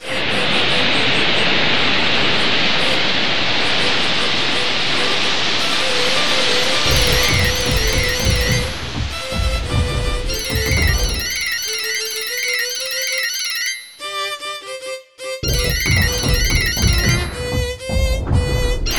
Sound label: dramatic beach